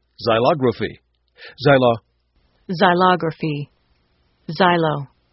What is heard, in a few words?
xylo